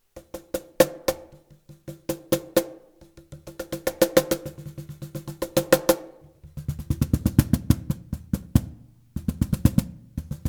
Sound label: Meinl Cajon Artisan; Meinl Cajon Test